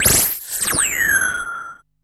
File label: SYNTH FX